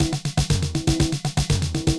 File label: Loop, 505; classic machines